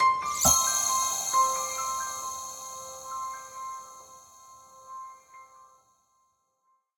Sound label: feature Splash Start; netent; doublestacks